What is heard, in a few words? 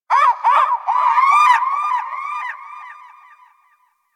Bird Lure Call Success; Warframe; Hunting Critters; Sawgaw